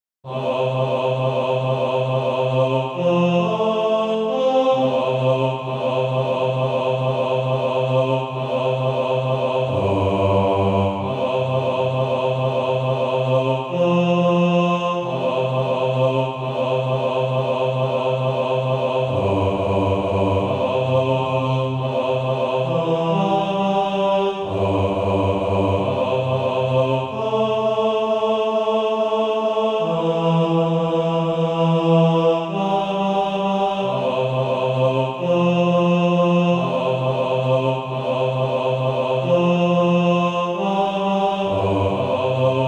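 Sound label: bass, Practise Music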